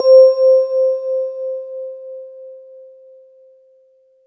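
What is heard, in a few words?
Kawai K1 II Bright Electric Piano C5; Synthesizer - Electric Piano; Brt-El-Piano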